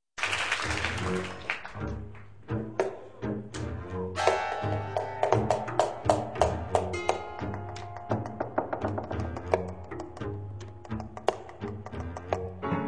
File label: tango; Piazolla